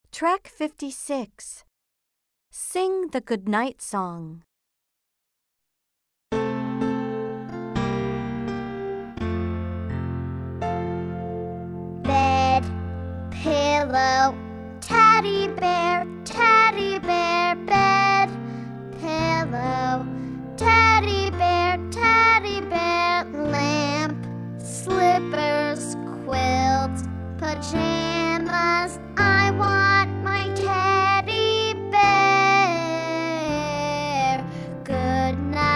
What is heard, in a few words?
goodnight song slow